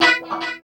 GTR -R; East West; Phat & Phunky

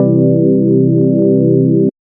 Classic Organ Chord; Big Fish Audio; OSH Chords

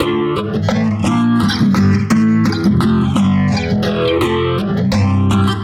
Guitar B, 85bpm, analogue circuit; musicradar

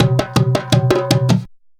junku groove; Best Service; World Colours

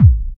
DR. LOOP, DRUMS; X-Static Goldmine 3

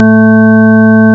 ORGAN; CMI Sound Library Rev 1.3

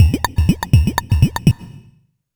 Percussion Loop, Synth Percussion; Sonic Foundry (Sony Creative Software); Dr. Fink's Funk Factory

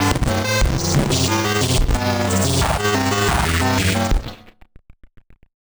Malfo Syn, malfunctioning synth

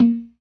PERC, Synth and Organ

